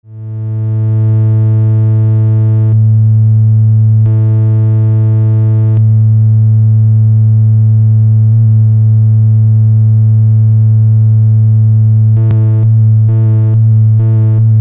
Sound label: Seq Switch audio